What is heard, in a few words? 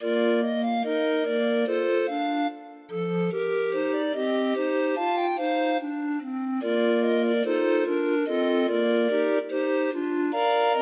Grind Organ; Handel